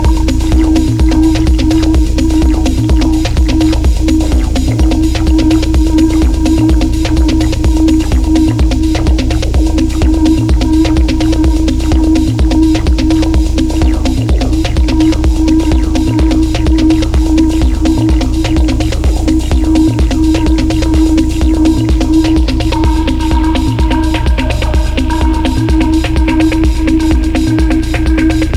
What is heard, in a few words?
Pop Song